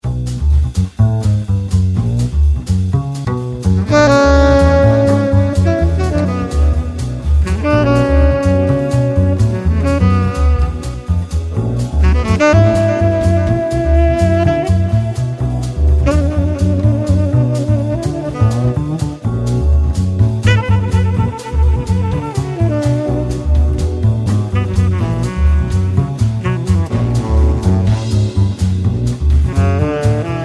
energy jazz

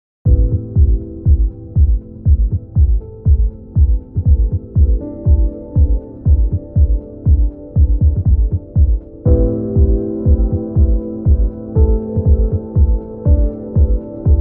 electro pulse

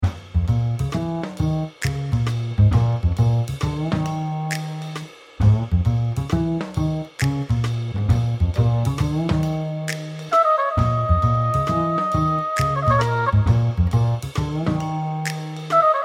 swing clarinet; JAZZ

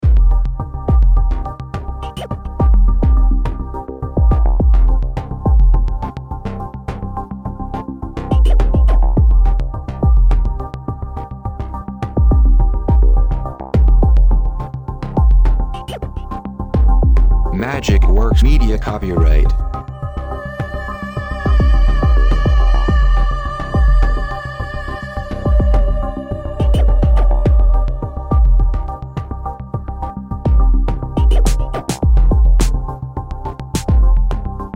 zen electro

